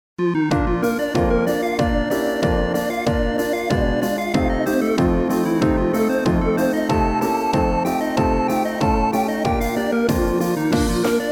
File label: Clarinet Polka; REHARMONISED